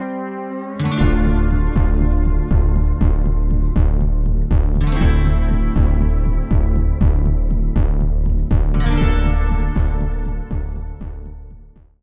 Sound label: Tense